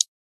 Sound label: onselect